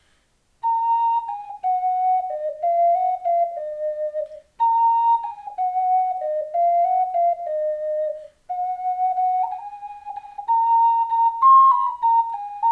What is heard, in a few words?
ocarina